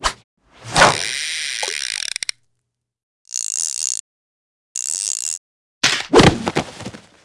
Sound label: Fishing Fail; MiniGames